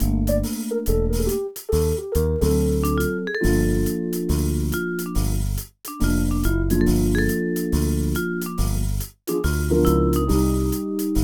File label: Jazz; Kirihara Shoten Forest Eigo at DS; NDS